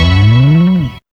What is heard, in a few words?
BASS LD; X-Static Goldmine 1